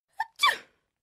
anime sneeze

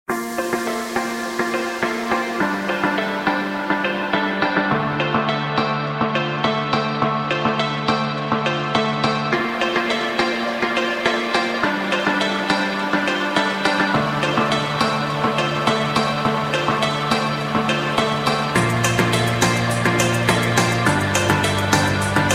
Drift Phonk

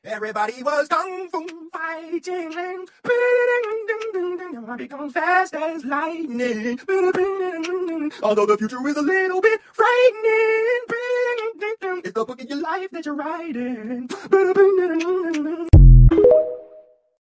BeatBox Sound Effect; Meme

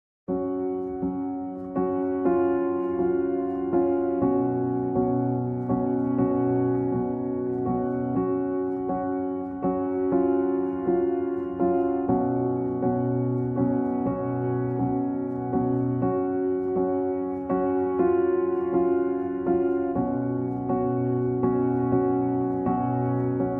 goodbye instrumental

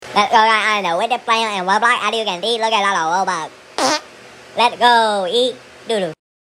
Fart; Lets go Eee Do Do; Tik Tok

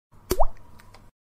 Water Drop

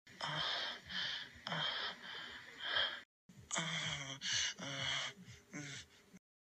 Dream Whimpering

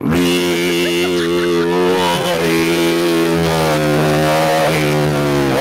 Throat Singing

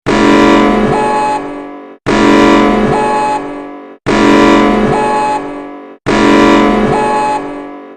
Greece eas alarm

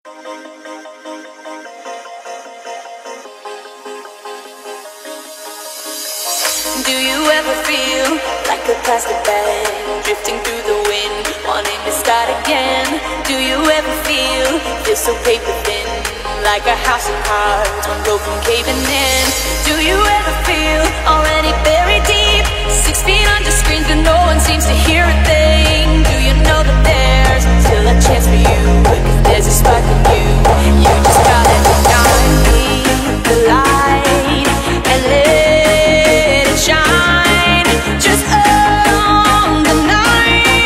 hardstyle

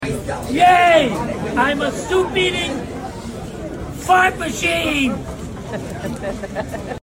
Soup Eating Fart Machine; meme sound

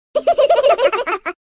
Laugh; Skull kid

